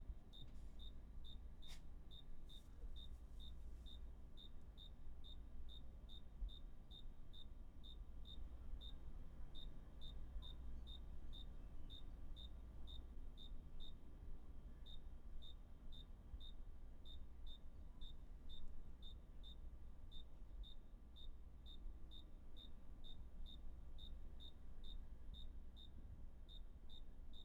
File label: grasshopper